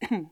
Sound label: clear Throat Sound